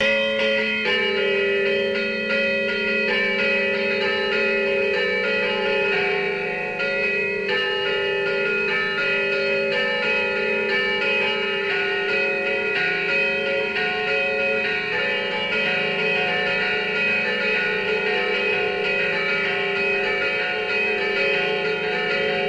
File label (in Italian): campane di san michele